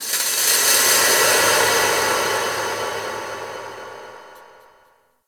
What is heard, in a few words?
Texture Small, Ambience; Warframe; Orokin Moon